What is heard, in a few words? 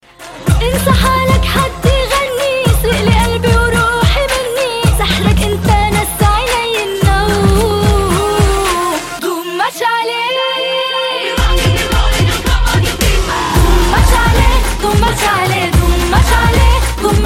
arabic lady; dhoom 3